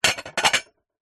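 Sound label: household plate dishes place onto stack of others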